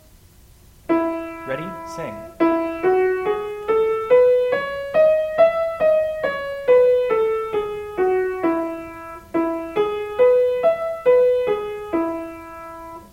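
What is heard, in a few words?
E Major, scales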